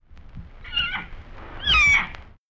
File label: cheetah cub